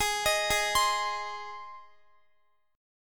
Ab Major Ab, Guitar; Ab arpeggiated; x,x,20,20,20,20; 4F,4A,5C,5G,5C,6E

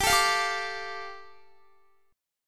G 7th Suspended 2nd, Guitar; G7sus2 strummed

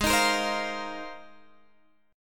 G sharp 6th Add 9th G sharp Guitar Open G

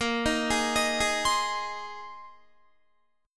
Bb 9th Suspended 4th, Guitar; 18,18,18,20,20,19; 4E,4A,5D,5G,5C,6F; arpeggiated

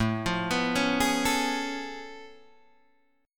A Augmented Major 9th A down Guitar Open D

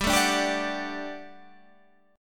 G Major 7th Suspended 2nd G down; GM7sus2; strummed

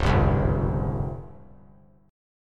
C sharp Major 7th Flat 5th, C sharp, chord; strummed

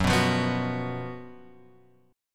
F sharp Major 7th Suspended 4th; F#M7sus4 Chord {2 2 3 X 0 2} strummed

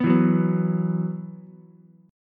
B Suspended 4th, Ukulele; 4,4,2,2; strummed; JGuitar

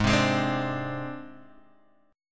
Ab Major Flat 5th Ab

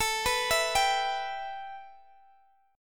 A 7th Suspended 2nd, A, down, Guitar, Open D

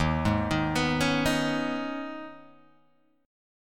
Eb 7th Suspended 4th Sharp 5th, Guitar Open G; down

